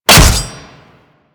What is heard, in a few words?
Barrage Hit Metal; Warframe; Warframes